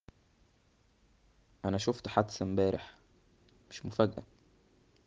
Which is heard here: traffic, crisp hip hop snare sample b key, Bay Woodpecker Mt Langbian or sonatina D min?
traffic